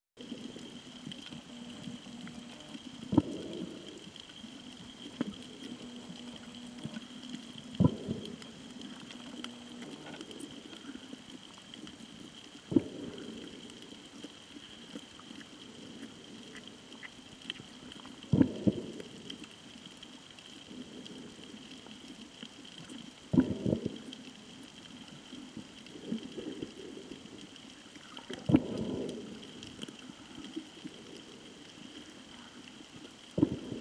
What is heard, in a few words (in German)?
Schnorcheln; Nang Yuan; Original Video Ton; Koh Tao; 19. Dezember 2019 - 6. Januar 2020 Bangkok - Koh Tao (Fieldrecording Audio, Foto, Video)